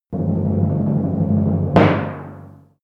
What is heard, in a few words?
drum roll timpani